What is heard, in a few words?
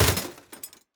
metal break; teardown; ps5 windows xbox series xs